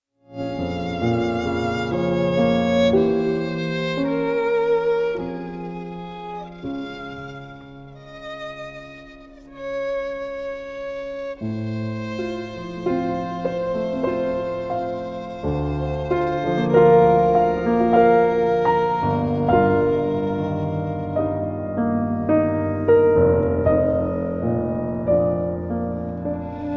sonata in e minor op 82 for violin and piano; Sir Edward Elgar; Steinberg Duo

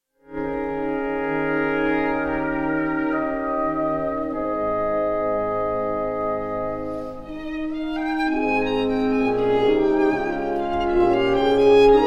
scottish fantasy for violin and orchestra; max bruch; op 46

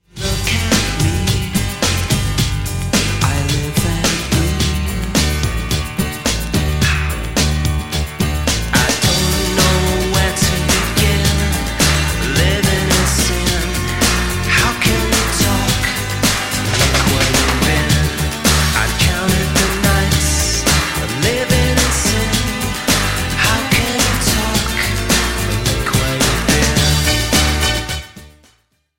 electronic

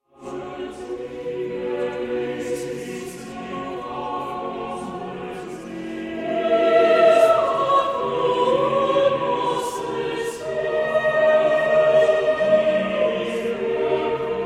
chamber choir; sir edward elgar; rias chamber choir; marcus creed; there is sweet music

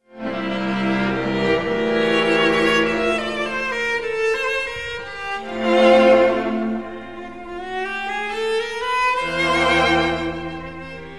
string quintet in c major; wolfgang amadeus mozart

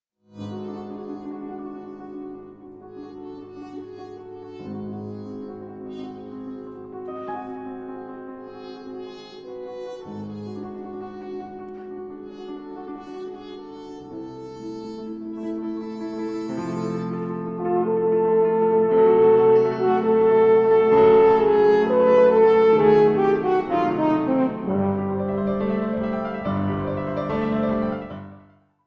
villanelle for horn and piano; paul dukas

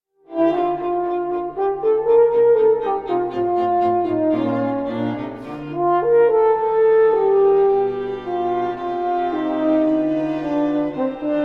horn quintet in e flat major allegro moderato; wilhelm gottlieb hauff; 1st mvt

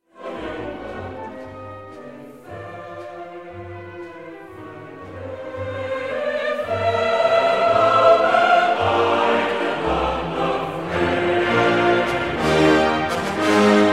for high voice chorus and orchestra; spirit of england op 80

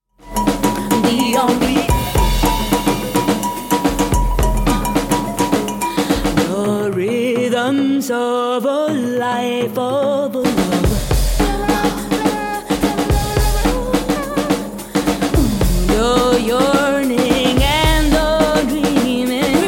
drums offbeat

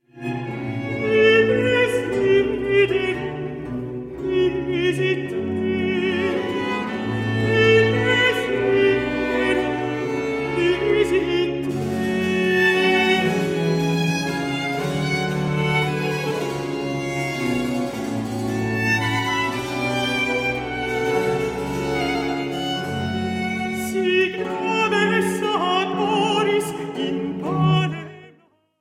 motet for 3 voices 2 violins and bc; Chare Jesu Suavissime

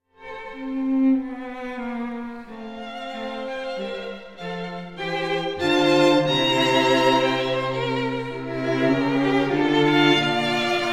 string quartet in C minor; wolfgang amadeus mozart

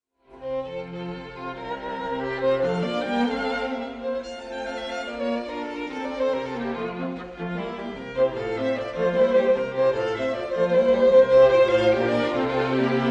quartet in b flat major for string; Wolfgang Amadeus Mozart; Hunt

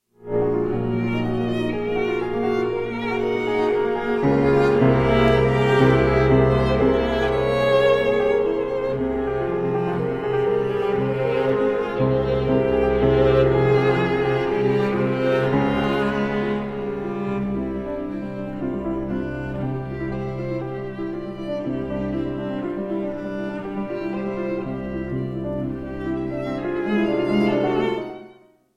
trio in G minor for piano and strings; op 8

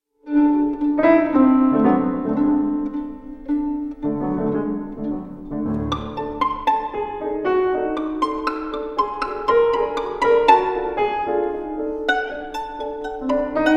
sonata for violin and piano in G major; maurice ravel; 1927; 2nd movement

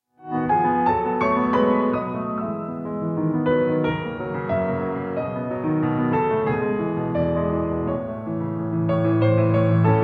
sonata for piano 2nd movement; ludwig van beethoven james rhodes; no 27 in e minor op 90

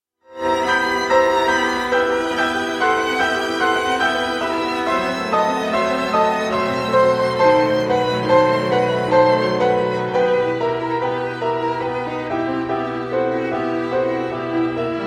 quintet in a minor for piano and strings adagio; Edward Elgar; op 84; 2nd movement

